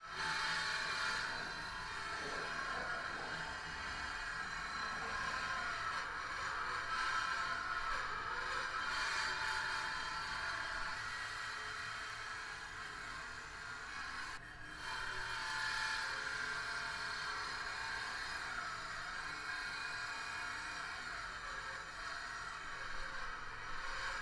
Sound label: circular saw; devices accessories utilities; NS Studios - ultra sound collection